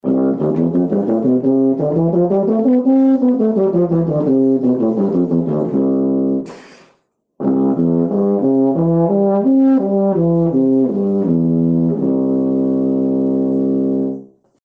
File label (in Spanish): tuba